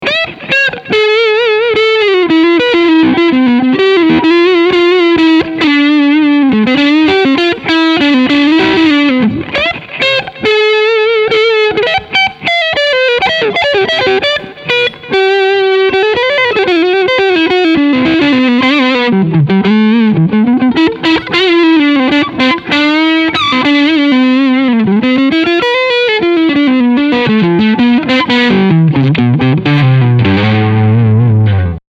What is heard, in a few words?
Front PU Overdrive